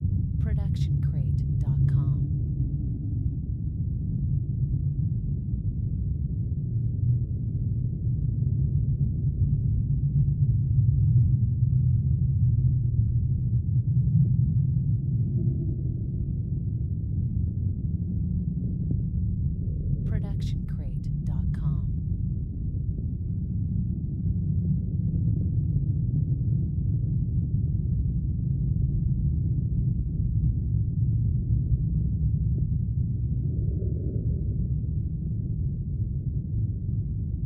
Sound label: wind subsonic; soundscrate